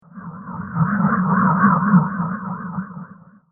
Flying A Good Old Saucer, science fiction sound effect